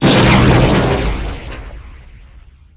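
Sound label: explosion gas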